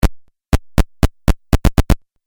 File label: Footsteps Video Game; Twistedtracks